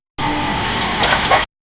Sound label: aura farm charging